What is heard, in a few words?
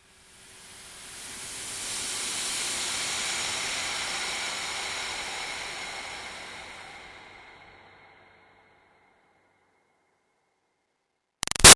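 Swell Airy Toxin Steam Release Medium; Sound Design; Big Room Sound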